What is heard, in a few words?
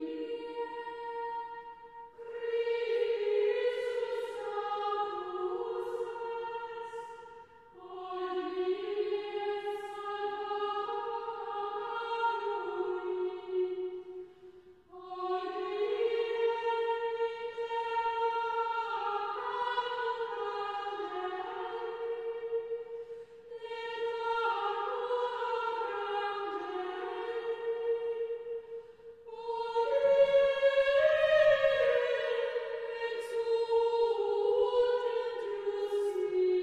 Chant Antiphon; Traditional; Chester Processional